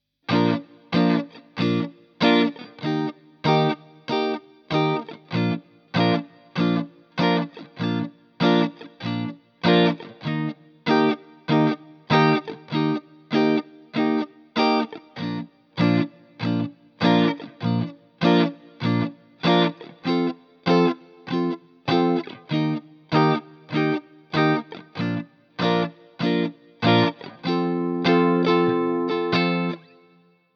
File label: Bb Basic 12 Bar